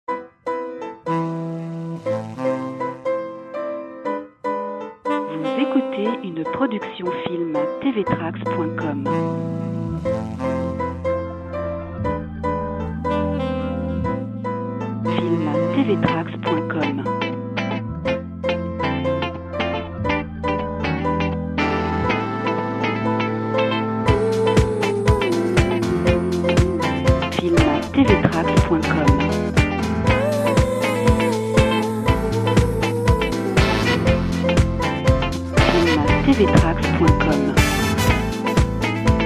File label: Funk